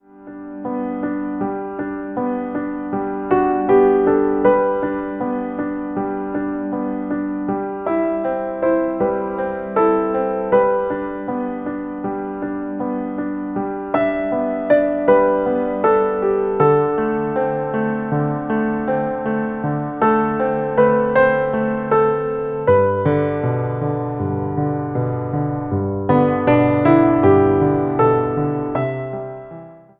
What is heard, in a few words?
Piano practice; MIUI14 and HyperOS 1.0